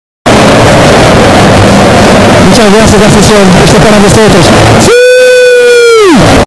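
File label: siuuuu bass boosted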